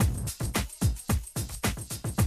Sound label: Classic DrumLoops, 110